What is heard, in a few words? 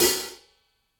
HIHAT 14 brush loose